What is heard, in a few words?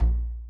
Bass Drum